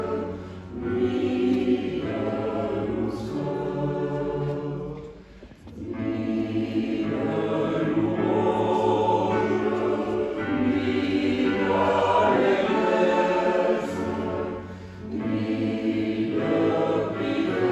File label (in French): Chant de Noel